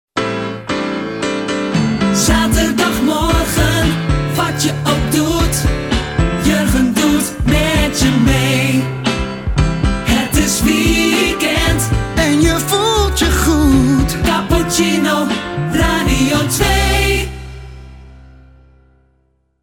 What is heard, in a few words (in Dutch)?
Zaterdagmorgen gezongen solo